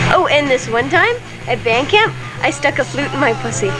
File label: flute